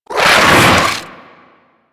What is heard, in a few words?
Moa Satyr Legs Roll; Warframe; Amalgam; Abilities